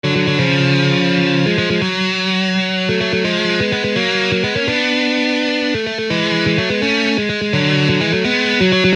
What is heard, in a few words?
Lick